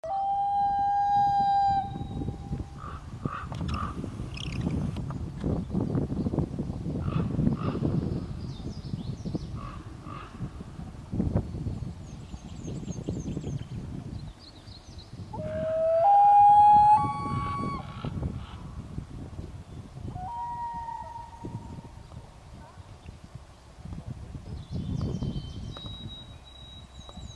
Loons

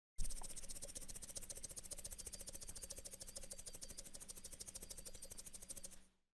Salt Shaker

